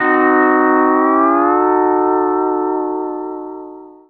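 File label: pedal steel chord C dim7 L, Country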